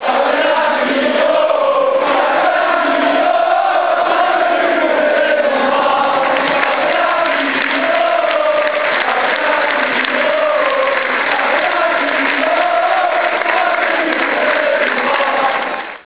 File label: hoo hoo, chants; Cavenaghi